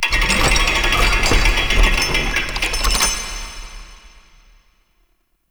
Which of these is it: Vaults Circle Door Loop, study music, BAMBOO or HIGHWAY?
Vaults Circle Door Loop